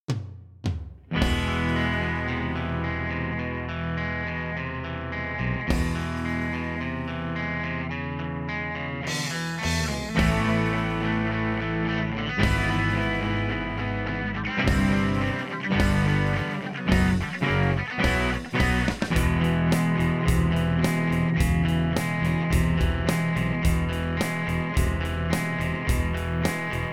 full Gtr Solo; bus Fx

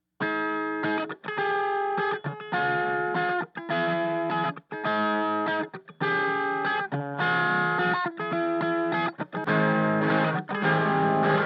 guitar Bus no Fx

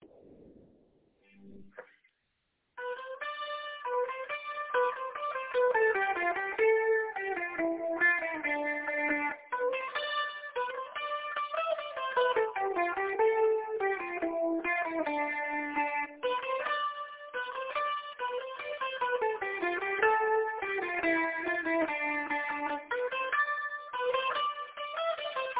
soaring solo long

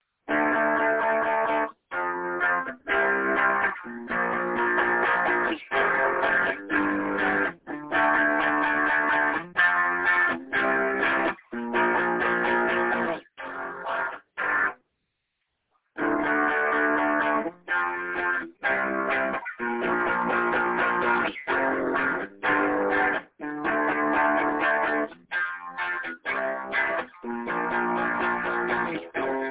Rock slash metal chords

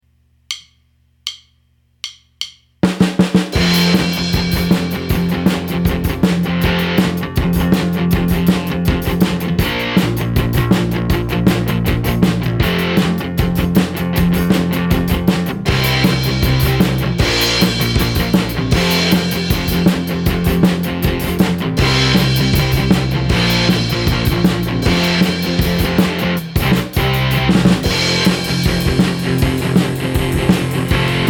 new Rhythm Gtrs; rough mix for checking sound, re-tracked rhythm guitars

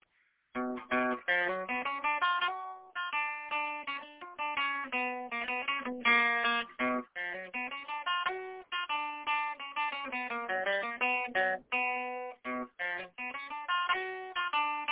modal melody

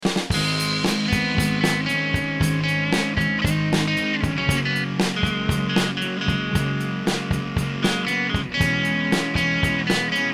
drum Beat with Bass; An idea that started as a drum beat (now with bass!)

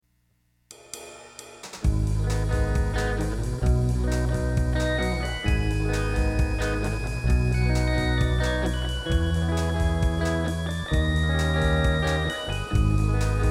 bass Fx; Short Season

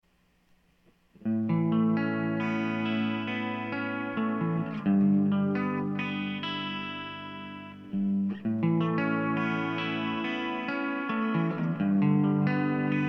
pad Gtr C3

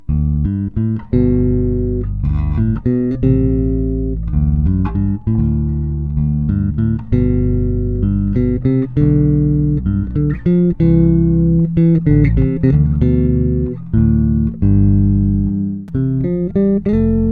bass Love Song; bass guitar

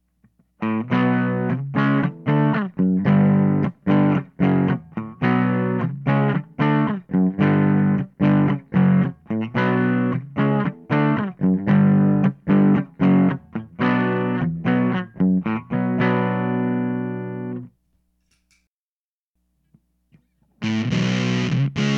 Fuzz Sounds no Eq

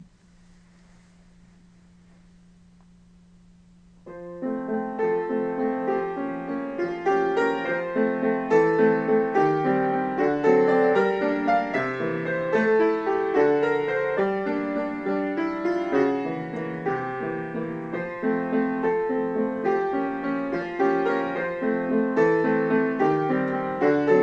little Waltz; composed in 2004; sheet music, ABC notation

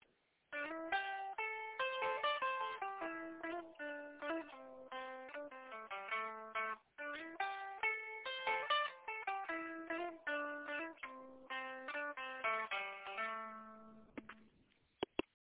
raga x southern rock solo intro

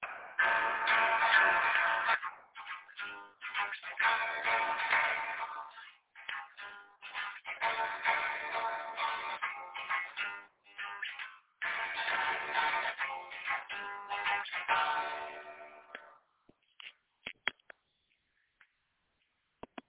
open d chords; Steve winged steed

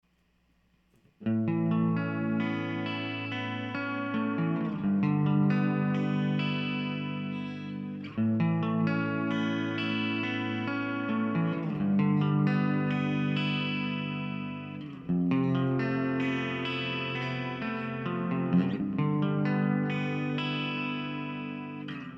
pad Gtr V63M, mic tests

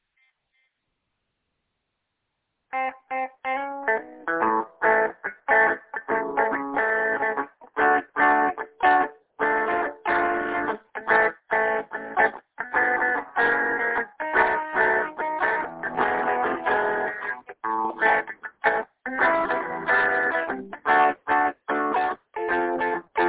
jazzy changes

